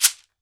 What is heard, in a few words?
SHEKERE, Drums&Percussion